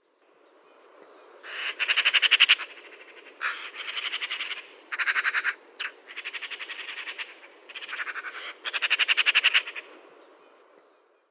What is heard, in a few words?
picpic, balsis; a.celmins